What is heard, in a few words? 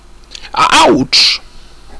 dark pain